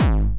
Jump kick, Jump hardstyle kick

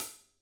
Closed Hat; Digital Sound Factory

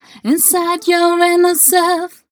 f mel d, fem Vocals

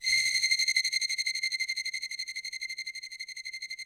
ROUGH STRNGS, Yamaha VP-1; Music Store

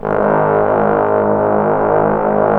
BRS BSTBN, Bs.Bone Sect

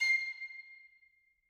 piccolo stac C7, Woodwinds; BBC

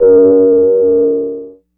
Moog one shot, Synths; Sonic Foundry (Sony Creative Software); Electronic Point Blank

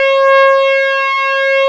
DETUNE

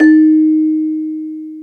CELESTA D#4; AKAI S-Series CD-ROM Sound Library VOL-2

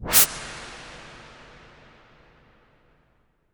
IMP WIPE, Cinematic Impact

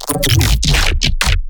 glitch stab; Virtual Riot; Glitch Stabs

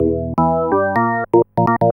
ORGN LICK, K E Y S

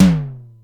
SDS9 HT, Simmons SDS-9; SDS9 Factory A4

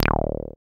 SYN 303, Roland TB-303

